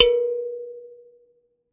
Finger, Prepared Kit; Battery 3 Sample Kits

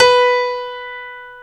StereoGrand, Keyboards; E-MU Producer Series Vol. 2 – More Studio Essentials

